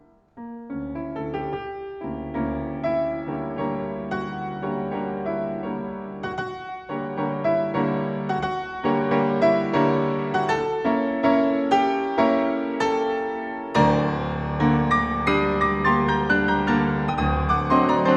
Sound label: Ballade; Trumbore